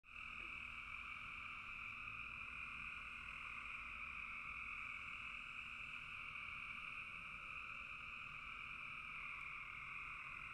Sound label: frogs in bog; 29minutes; post-dusk frogs croaking